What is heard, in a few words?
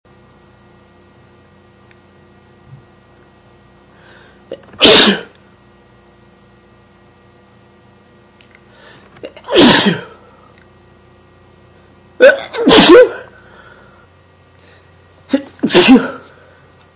sick, male